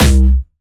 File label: C2, Jumpstyle Kick; vengeance essential club sounds-3; VEC3 Bassdrums